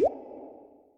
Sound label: bubble